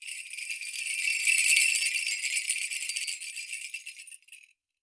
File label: skranz, WORLD PERCUSSION